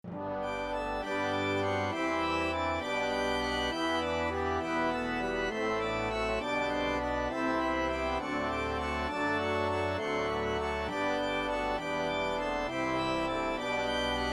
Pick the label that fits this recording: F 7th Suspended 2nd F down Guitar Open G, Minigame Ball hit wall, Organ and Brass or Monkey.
Organ and Brass